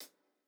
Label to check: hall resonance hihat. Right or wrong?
Right